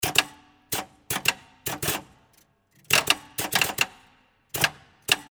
auto switch

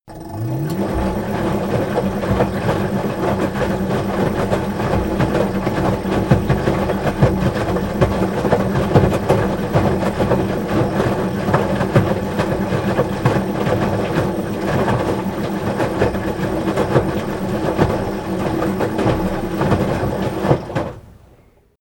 washing machine start to break

